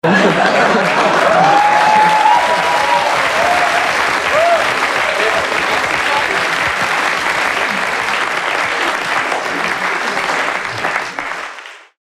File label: gameshow laughter applause